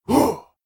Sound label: Hoo Many Voices